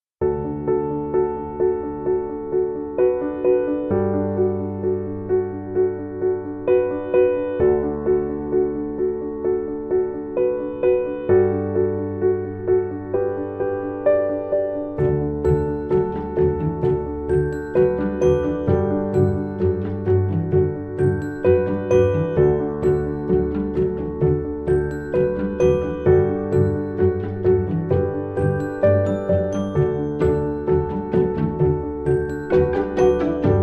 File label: Playful